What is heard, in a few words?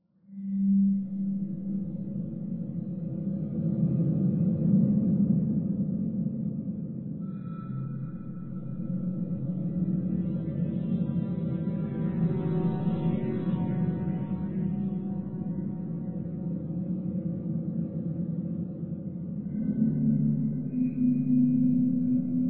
drone